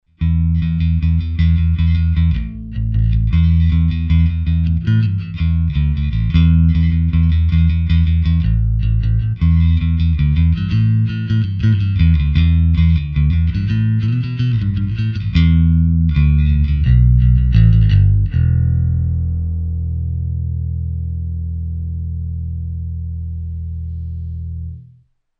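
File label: ROCK DRIVE, Zoom 506 II bass effects factory preset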